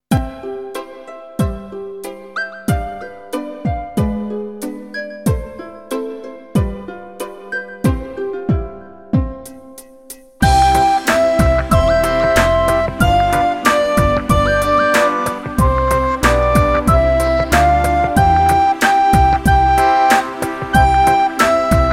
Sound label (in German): Hänschen klein Listening, recorder